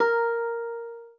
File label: spanish guitar